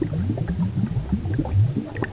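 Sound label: bubble loop, lava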